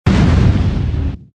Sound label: Dead Explosion; Sfx Baphomet Dead Explosion; Mighty Knight 2 Online